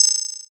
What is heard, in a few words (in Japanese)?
suzu